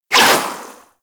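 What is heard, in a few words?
Primary crossbow Tail; Warframe; Tenno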